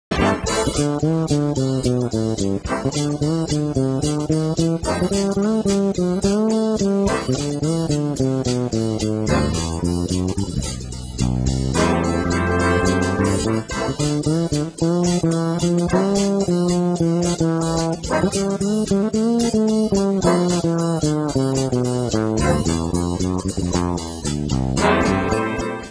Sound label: Bass